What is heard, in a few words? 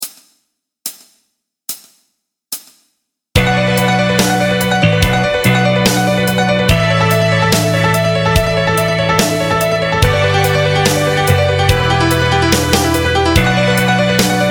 Shred Guitar Exercises (Backing Track)